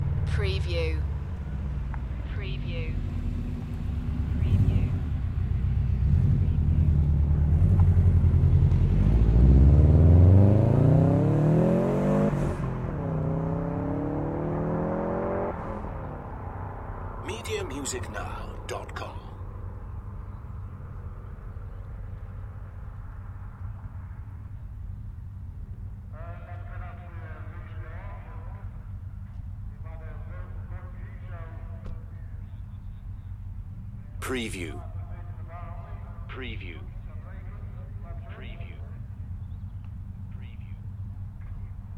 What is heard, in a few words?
Dirt track car racing UK ambience from a spectators position; beanstalk audio